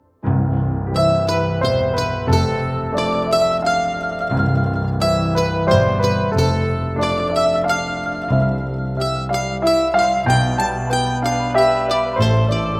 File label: mix piano